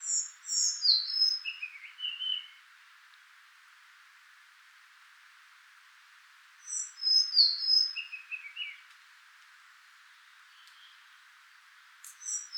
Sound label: Ficedula albicollis